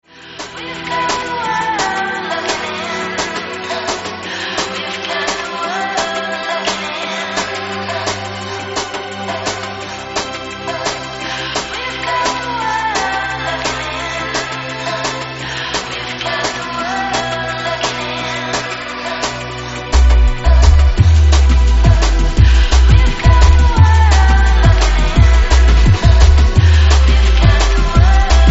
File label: symphonic